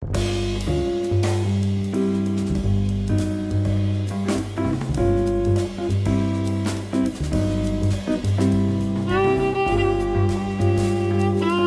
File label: jazz quartet